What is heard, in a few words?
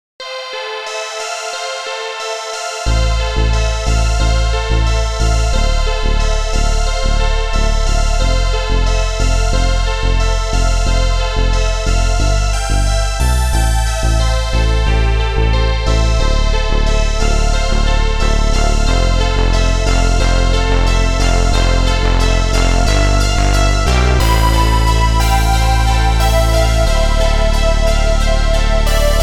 Synthwave Horizons for Arturia Pigments